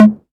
clean tom one shot g sharp key, Royality free tom drum tuned to the G# note. Loudest frequency: 331Hz